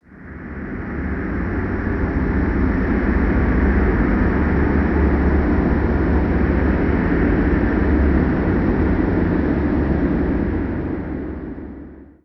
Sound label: DEEP METALLIC ATMOSPHERE; DEEP ATMOSPHERICS; Sonic Foundry (Sony Creative Software); Vir Unis Infusion